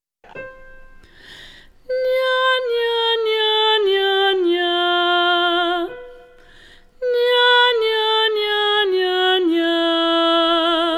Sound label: Midrange Exercise